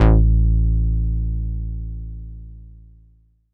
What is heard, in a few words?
Italiano a2, Korg Poly-800